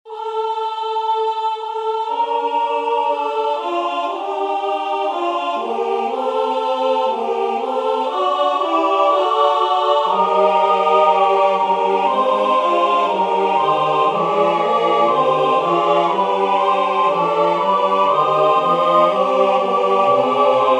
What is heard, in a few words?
tutti; 7Paroles; Unus